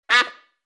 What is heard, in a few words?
quack; Animal Sounds; Various Animals; Short calls